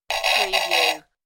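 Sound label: Funny Toy Sound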